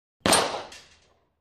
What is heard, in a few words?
Pistol Fire Hit Targ